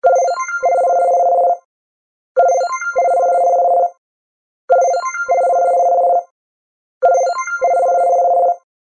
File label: Soft Ring; LG